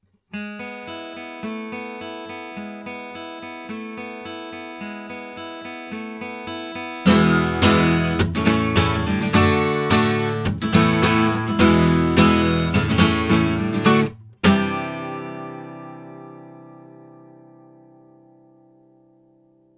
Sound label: C6000 Pro guitar Gibson; JJLabs